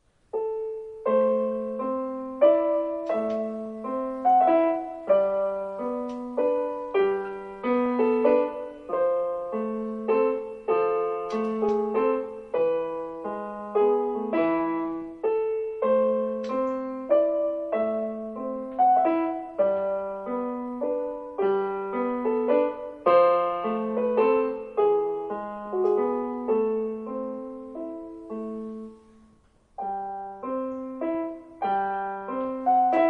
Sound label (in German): greens, Klavier, Irisches Volkslied; 'Greensleeves'; (entstanden um 1500)